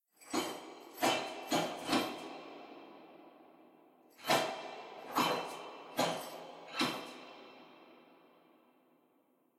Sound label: walk sneak and peek